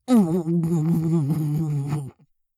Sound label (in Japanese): 泡ふき